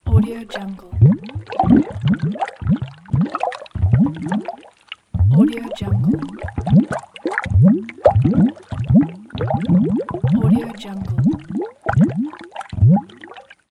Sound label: water bubbles; fx-library